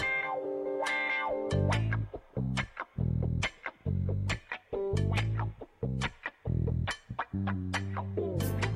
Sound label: slow bow chicka, Stock Music